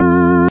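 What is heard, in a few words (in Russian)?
орган одиночный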